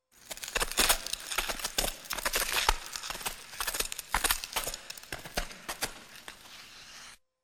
vyhodit na led i skolzit; Звуки катания на коньках

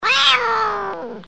razdrazhennyj krik kota

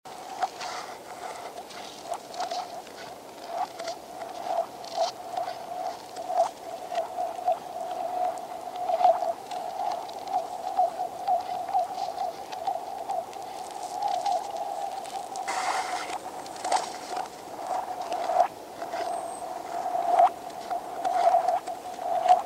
na shvedskom ozere

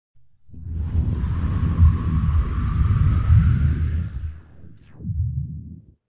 zvukovoj jeffekt volny dlja perehoda

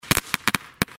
petardy u malchishek vo dvore; Звук петард

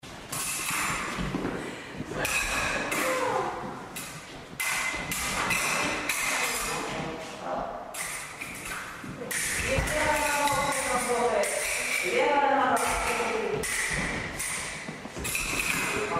atmosfera trenirovki v zale

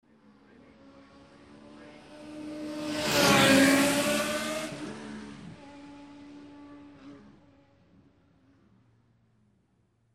stremitelno blizko

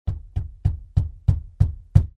mjagkie gluhie stuki; Звук стука в дверь

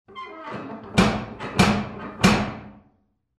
skripuchij knoker; Звук стука в дверь для сказки; Для детей, для детских постановок

